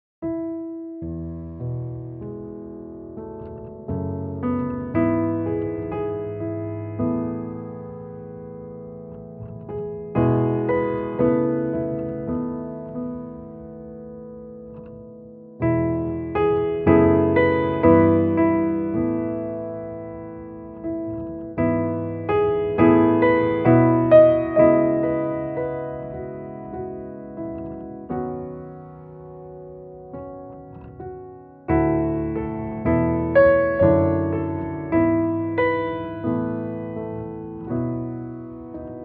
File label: rosewood grand